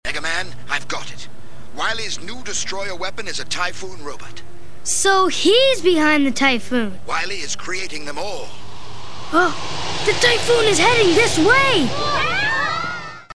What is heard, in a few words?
dun dun dun; megaman